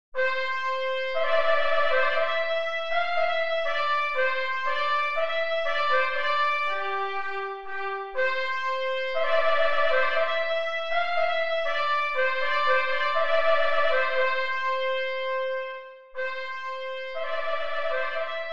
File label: trumpet voluntary; clarke jeremiah